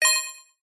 multimedia game tone sci fi futuristic beep action